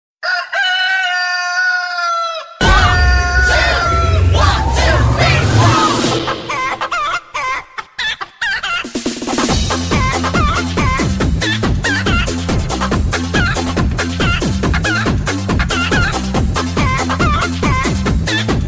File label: Chicken